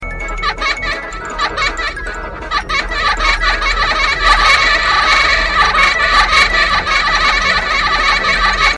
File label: Laughing